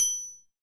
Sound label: TRIANGLE, HR-16 Drum Mashines; EdgeSounds; Drum Mashines VOL-1